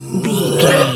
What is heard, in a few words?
bear pain hurt groan